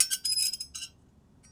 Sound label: Unpleasant Scraping, Kitchen Knives; Micro Pack